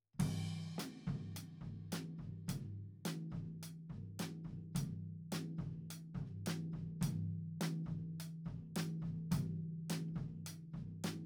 tom; logic

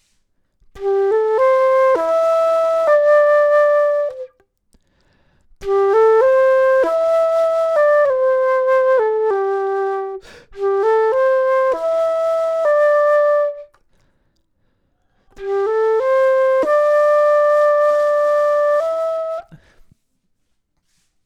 flute

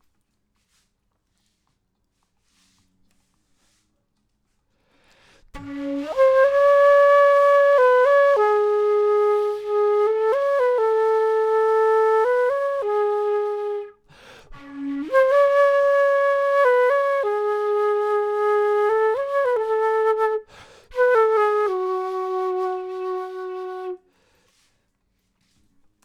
flute intro; logic

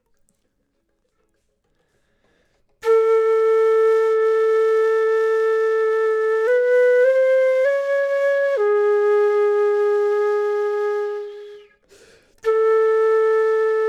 flute; logic

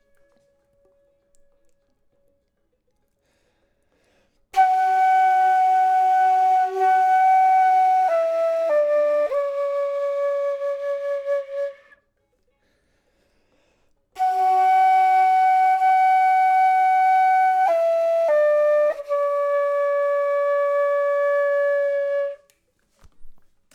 flute; logic

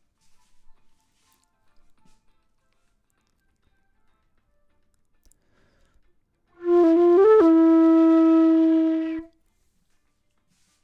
flute; logic

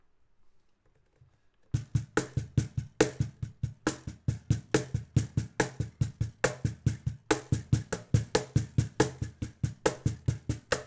cajon; logic

